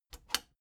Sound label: plastic button latch scratch disable fast